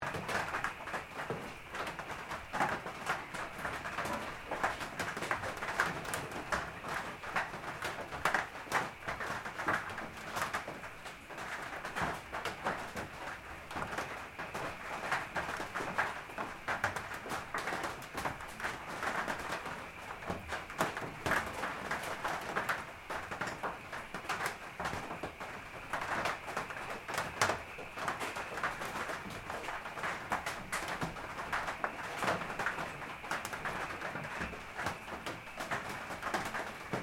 Ambience Night Country Rain Sporadic Tin Roof Loop Stereo, Night time country Ambience; Farm Sound Effects